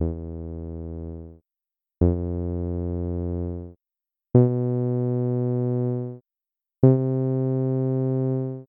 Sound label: subtractive synth; Max